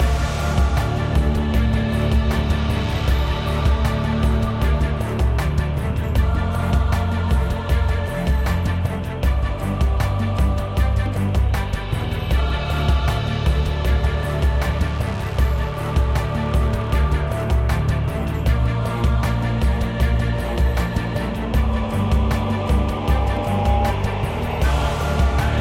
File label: music main; hades River Of Souls; Fantasma